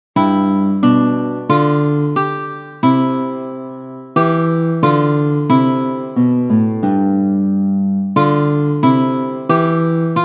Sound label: Two guitar strings